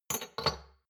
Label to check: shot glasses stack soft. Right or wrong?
Right